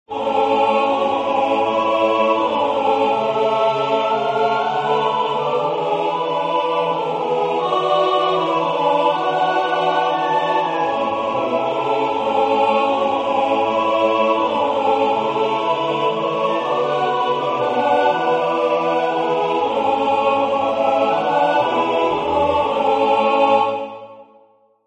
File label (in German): Jodler